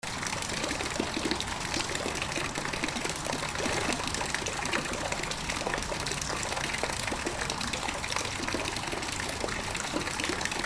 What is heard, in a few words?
atmo san jose outdoor fountain nearby traffic